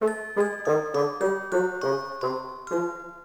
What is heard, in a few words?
Expectant